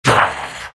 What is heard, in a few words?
big pain; Undying